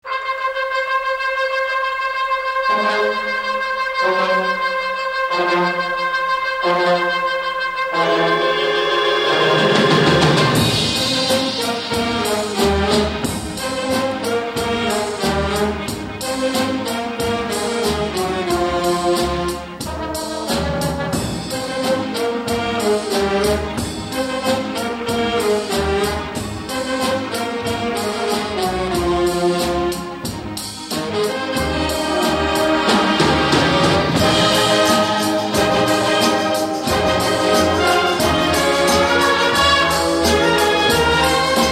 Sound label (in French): Biere et Fraulein, Instrumental